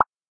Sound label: open